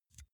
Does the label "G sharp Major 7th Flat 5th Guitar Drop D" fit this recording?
No